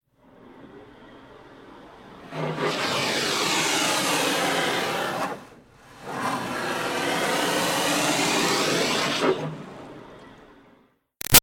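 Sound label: Water Spray Interior Car Grill x2; Car Wash; BigRoomSound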